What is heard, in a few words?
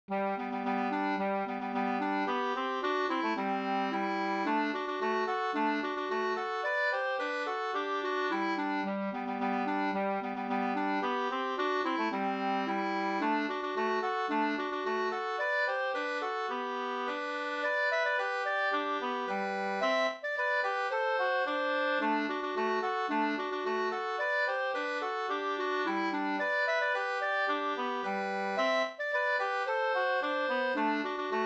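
polka G major; fr Roros no Gdur 2st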